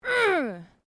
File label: FEMALE OUCH; Female vocal sounds; Pain (Sound Effects:Voice)